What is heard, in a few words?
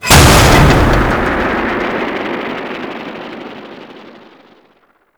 Frame Land A; Warframe; Vay Hek